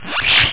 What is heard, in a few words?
computer; computer4